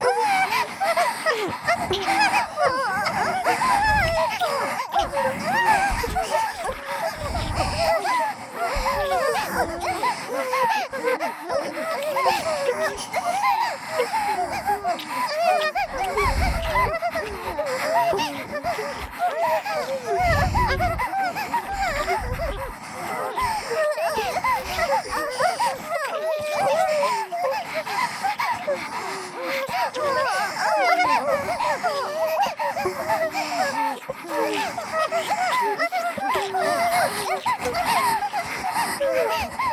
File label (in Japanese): W喘ぎ＋Wフェラ＋W耳元喘ぎ